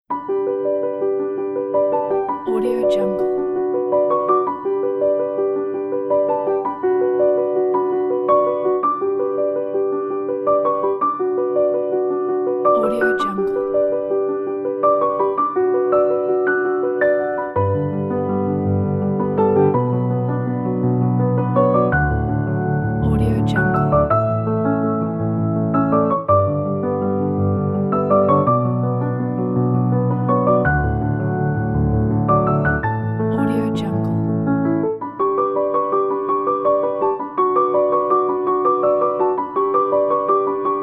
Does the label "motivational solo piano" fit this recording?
Yes